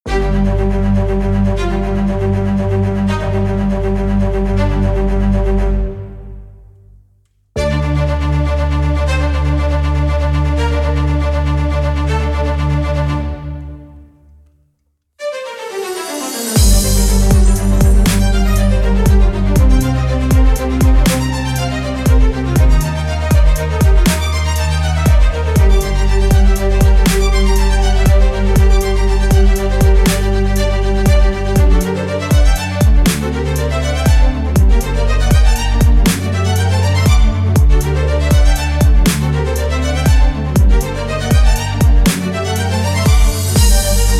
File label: phonk